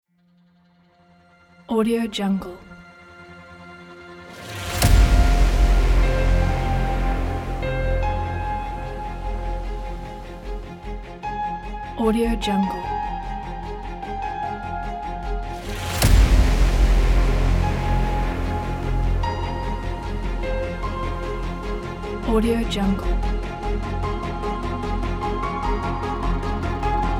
action hybrid trailer

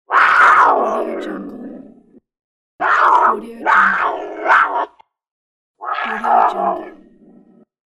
cougar roar

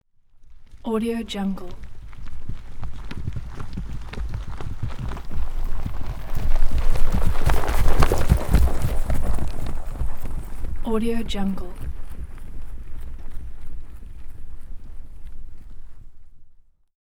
single horse trot by

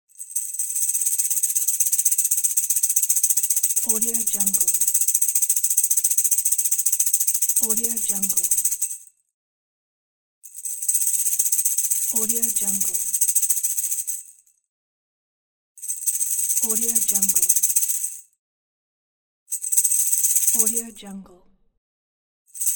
tambourine shake

